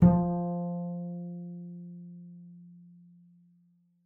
F3, bass pizz; Xsample VOL-5

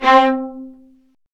String Sections, Violas Marcato; Roland LCDP13; STR Vas1 Marcato

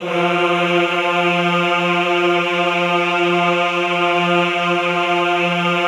EHH F2, Classical Choir; Best Service; Extended Classical Choir; EHH MIX

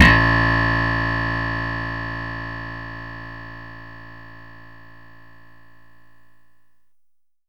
E. BASSH, Korg DDD5 Drum Machines; 300 Drum Machines

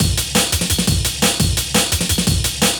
breaks, Loops; M8

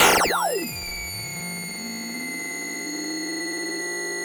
SYN, Drum & Bass, 160 BPM

